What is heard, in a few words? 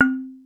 mallets